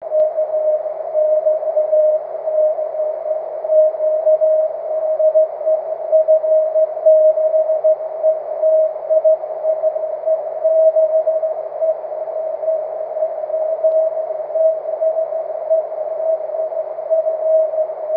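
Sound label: continuous wave, 23cm; f6eti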